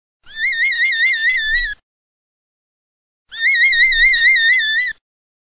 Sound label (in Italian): coni